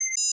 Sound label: Autoturret target lost; Rust